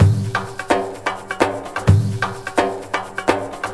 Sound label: Latin House Loop 128 BPM; Vengeance Samples